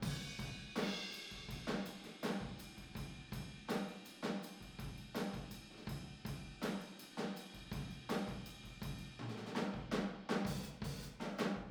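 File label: Boogaloo Fill Room stereo, 82 BPM; Sonic Foundry; Drums from the Big Room The Mixes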